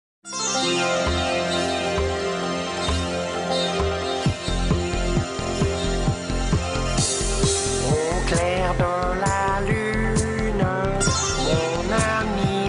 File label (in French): au clair de la lune karaoke